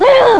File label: angry; GBA; MLSS; Fawful